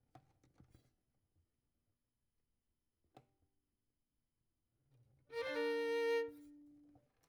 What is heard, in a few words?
Violin; Verdigris Music